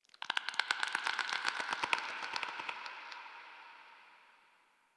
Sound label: Tendon Stretch Large Distant; Ambience; Misc Outdoor; Warframe; Infested Eris